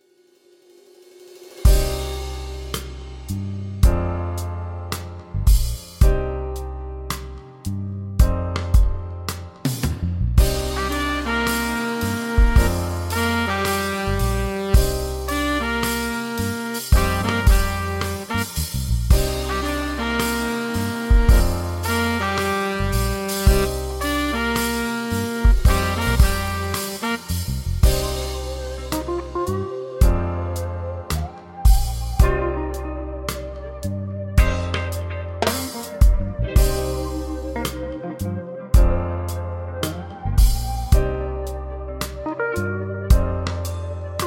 beaty 110bpm

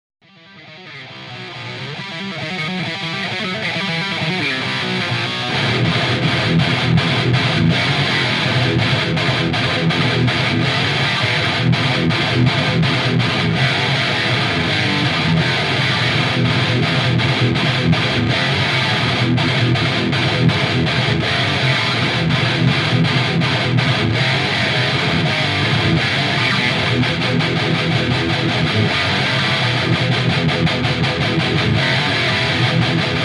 Kettner Access preamp Charvel 650 custom

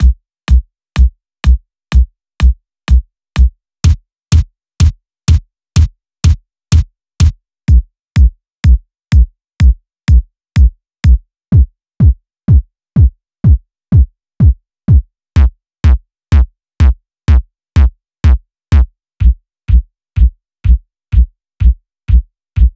tech house; tech house generator

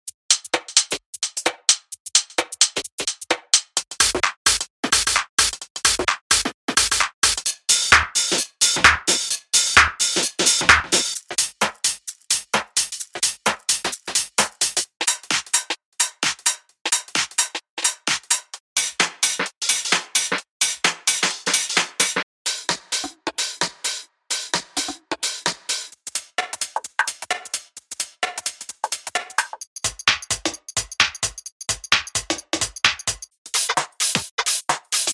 sustain garage top loops

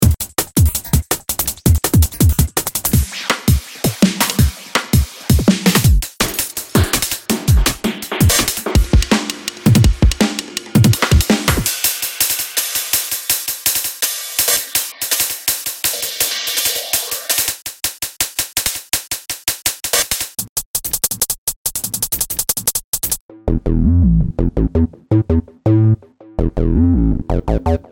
atmospheric drum and bass

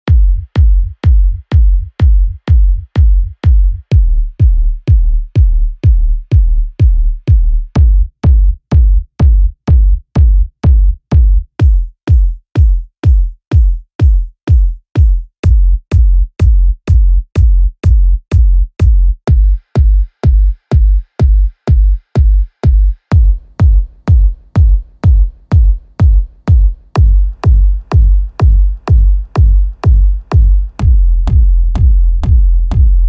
tech kicks

